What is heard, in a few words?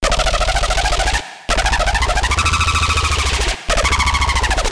Raw Distortion Screech D, bass